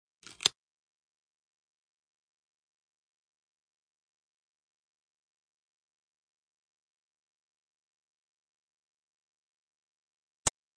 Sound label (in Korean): Fights, 싸움-전투; 헐리웃특수효과음